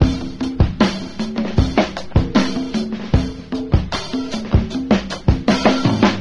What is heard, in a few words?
garage, Drum loops Funky